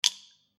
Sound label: click